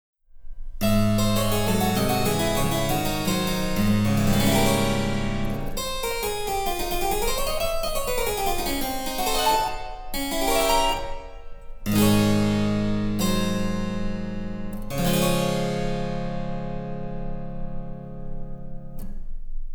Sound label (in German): Cembalo; Mark Lindley WTC 1